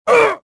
pain

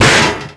picnic table hit; bouncyobjects; Tony Hawk's Project 8; XBOX

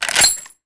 Lever Action Rifle Open Shell Eject; Weapons

